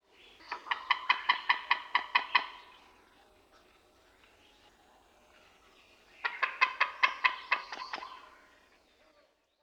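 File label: white-tailed eagle call, birds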